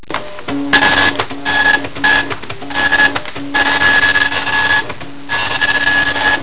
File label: dot matrix